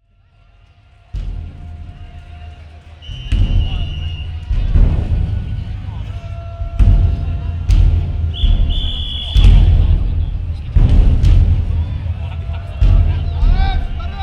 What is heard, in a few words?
oodaiko